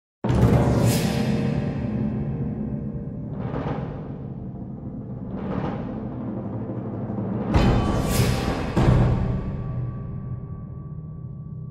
Event Shock; Empire of Angels IV

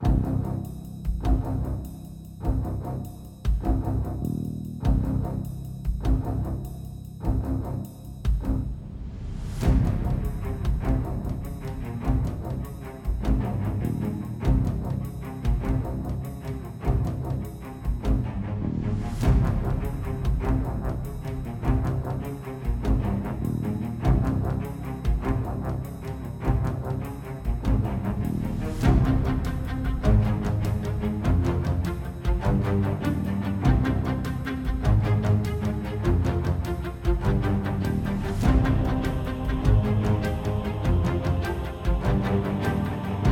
Action Desperate Escape 100bpm Mid; project zomboid

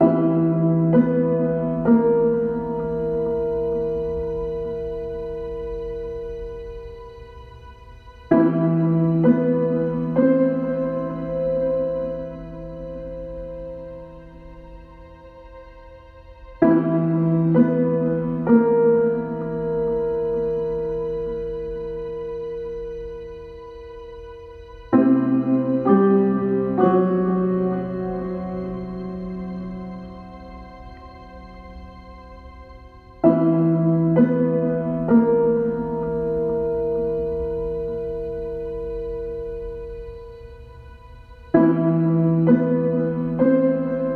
Short Mystery Loop; Eternal Edge

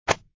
Cake drop

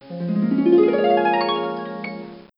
full harp; Nancy Drew: Secrets Can Kill

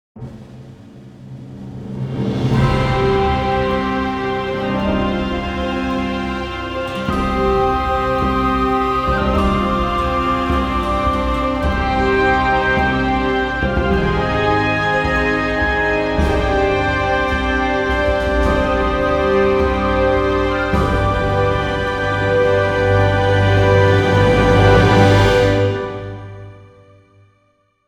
Music Intro; Epic Tavern Intro

